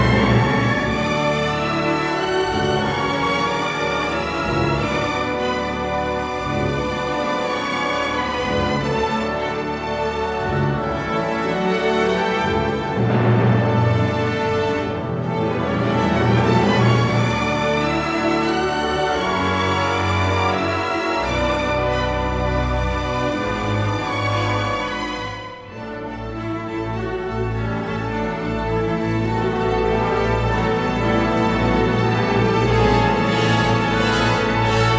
Symphony No 2 in D Major Finale Allegro moderato, Philharmonic